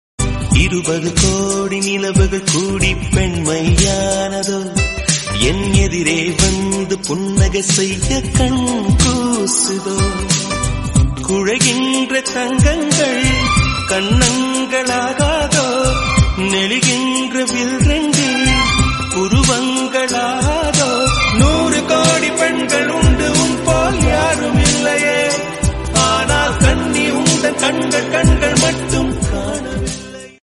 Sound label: Tamil Ringtone